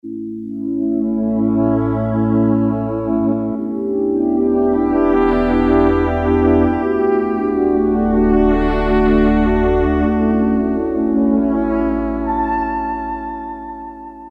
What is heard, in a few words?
Slow Brass Sweep, Roland PN-D50-01